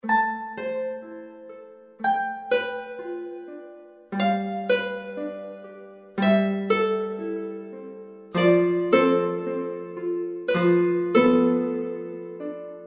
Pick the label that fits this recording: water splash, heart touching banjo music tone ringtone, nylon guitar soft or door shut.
nylon guitar soft